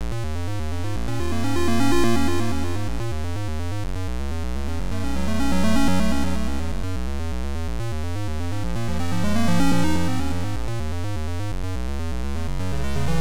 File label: TechnoPhonic